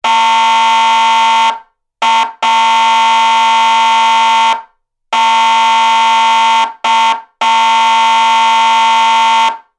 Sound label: horn, siren; sa441M